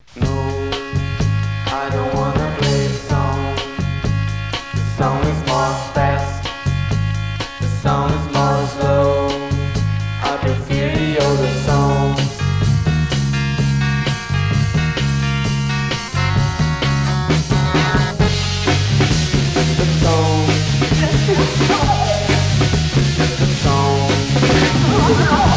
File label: choosy drummer